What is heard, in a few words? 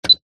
office electronic typewriter single button press beep brother ax 450